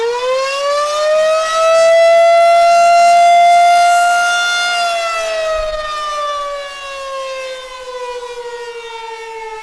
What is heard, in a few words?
tornado; university Police Department